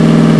subaru, rally; rchal

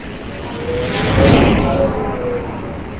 impact exotic; Earth and Beyond; EA Games

